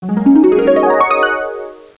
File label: harp